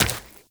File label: Run Flesh, Footsteps; Warframe; Tenno